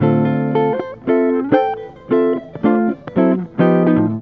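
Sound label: JAZZ GUIT GROOVE; Best Service; World Colours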